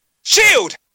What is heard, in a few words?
shield; shulk; potry v2